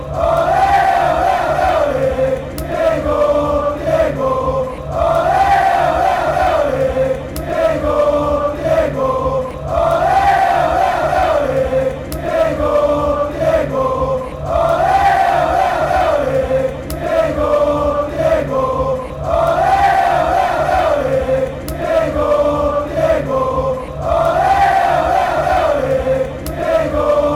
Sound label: ole, chants